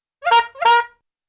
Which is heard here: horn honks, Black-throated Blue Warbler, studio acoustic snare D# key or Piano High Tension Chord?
horn honks